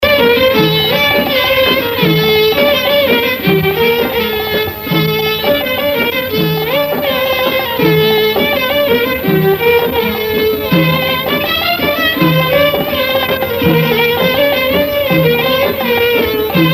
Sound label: Music